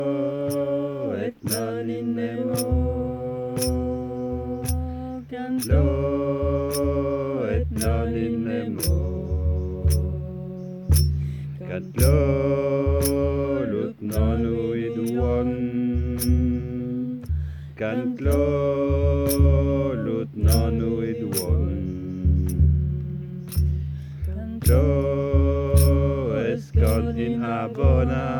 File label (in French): Chant; Chant des directions